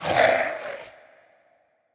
fire; smite; hades

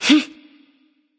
grunt jump; daji

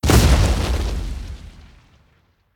ground impact; smite; god arthur

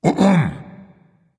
clear throat dragon; fafnir

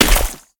Impact Large; smite; god bakasura

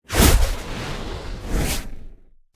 taunt fire; smite; pele

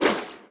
swim; smite; poseidon

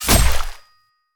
spear attack hit; smite; odin

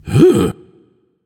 nv sigh; smite; zhongkui

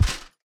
footstep; smite; god sobek

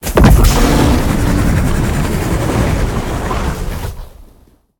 Fire Flames; smite; god merlin

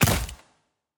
movement run; smite; vamana

